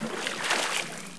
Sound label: wade, player water